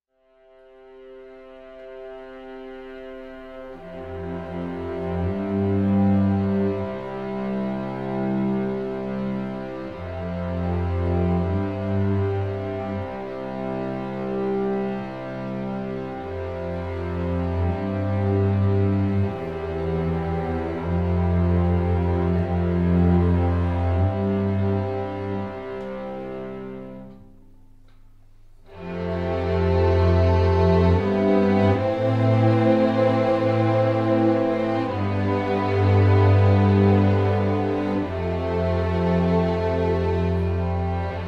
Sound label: instrumental